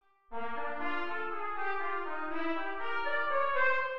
Dominante inv0 en C; El Napal

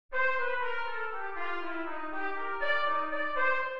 Min7 en C

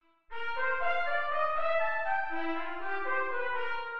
Dominante en C

